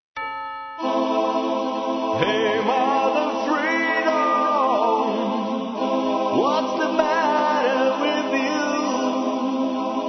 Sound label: blues